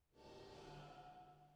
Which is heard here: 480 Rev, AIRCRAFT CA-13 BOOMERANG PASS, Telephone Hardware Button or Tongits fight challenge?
480 Rev